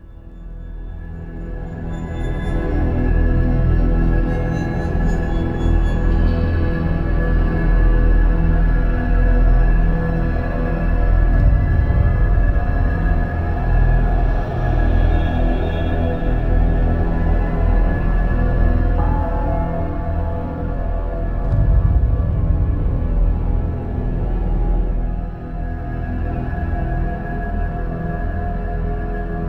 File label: Black Loop; bright memory mobile